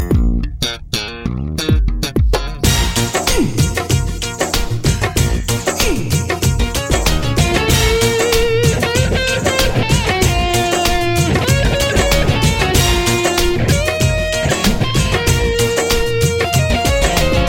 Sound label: sms theme